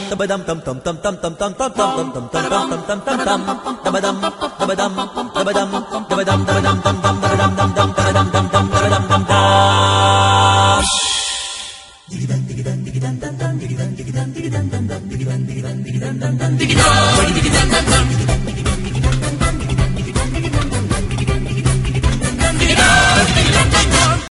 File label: Clasical